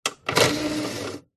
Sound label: household dvd player disc tray open